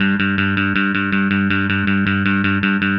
Happy Pub Bass; Sonic Foundry (Sony Creative Software); Indie Rock Anthems; Session 05 - Happy Pub

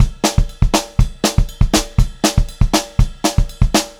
120 SPEED, Pop-Rock Drumloops; USB Soundscan vol.37; AKAI; 90 s Sample CDs

